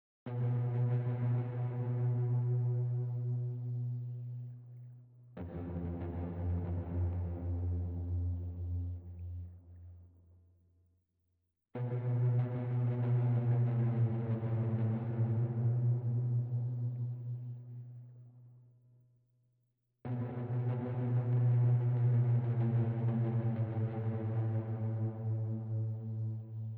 Prison Tension Loop; Silent Hill Downpour

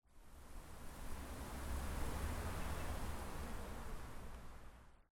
Wind; silent hill downpour; weather fx

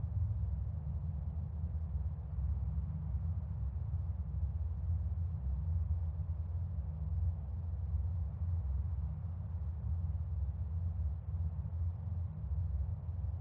Main Cave Loop; horror and atmosphere fx; Silent Hill Downpour

